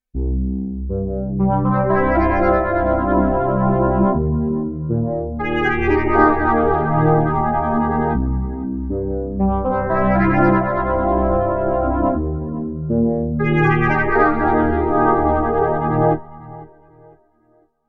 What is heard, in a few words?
KORG Node; Liquid Soap